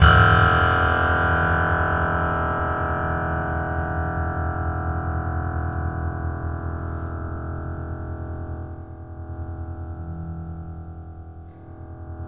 NOTESCR, The Piano; InVision Interactive

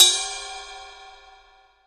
Drum Dry; East Collexion; Drum 1

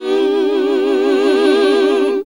HAL GOSP., GOSP. MMMhh; Sound & Vision - Gigapack II CD 1 (Roland)

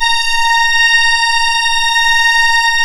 ORGAN, A#; Big Fish Audio; Synclav FM

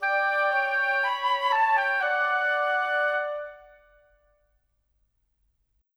Winds, Orchestral; Sonic Foundry (Sony Creative Software); Orchestral VOL-2; Modern 01

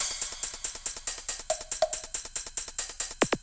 beat - full perc, grime, 140bpm; Zero-G Sound Sense Grime Scene; Construction Kits; Its Primetime

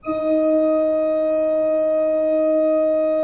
D3, Cathedral Organ; Propeller Island

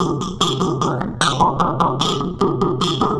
Throatbeat; Sonic Foundry (Sony Creative Software)

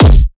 thicc boi kick

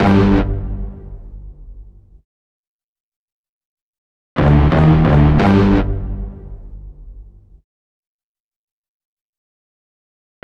Power Stab; Sonic Foundry (Sony Creative Software) - Club Joints and Street Anthems; Time Traveller

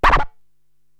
DJ Vocal Rub

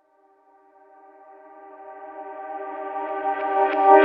Rhode Swell; KJ Sawka - Drum n Bass & Beyond; Swells

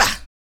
KUK, MALE PERC

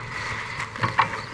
SQUEEKY; MACHINES

